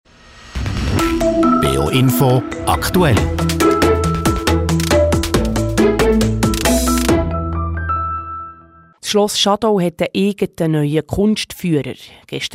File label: Jingle; SKF Schadau; Radio BeO